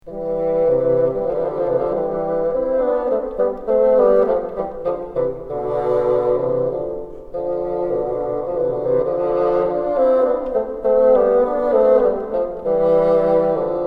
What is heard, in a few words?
together, bassoon; Quintuplets have been added to the end of the vibrato exercise